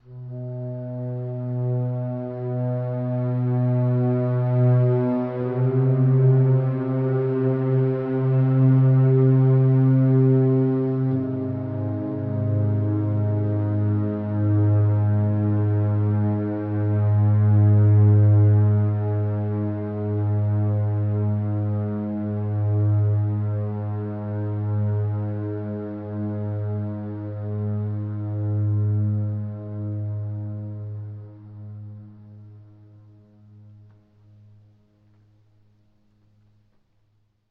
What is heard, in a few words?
ambient, vulcanus; Factorio